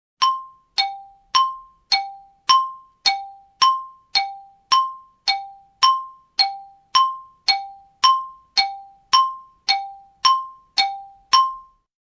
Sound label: Xylophone blinking melody